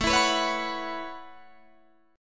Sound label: A 6th Add 9th A down Guitar Open D